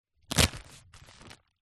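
first aid kit pouch fabric cellophane wrapped bandages inside open velcro; household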